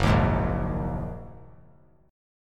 Gb 6th Add 9th Gb down; strummed; Gb6add9; JGuitar

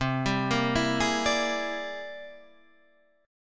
C 7th Flat 13th C, chord

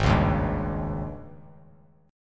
Ab Major 7th Suspended 4th, Bass Standard; strummed; 4,4,1,0; AbM7sus4; JGuitar

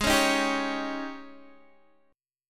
Ab Major 7th Suspended 4th, Guitar, Standard; strummed; AbM7sus4; x,11,11,8,8,9; JGuitar

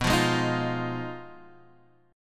B 6th Flat 5th B down, chord; strummed

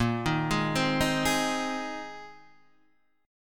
Bb 9th Suspended 4th Bb down Guitar Open D

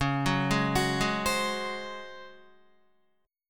Db Major 7th Suspended 4th Db down Guitar; arpeggiated; JGuitar

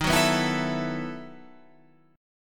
E Major 7th Suspended 2nd Suspended 4th D sharp

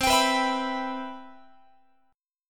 C Major 7th, Guitar; CM7 strummed

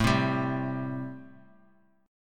A-Minor 7th A; x,12,10,12; Am7 strummed; JGuitar

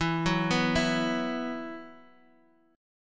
E Suspended 2nd, Guitar Open D; x,x,2,0,2,2; down

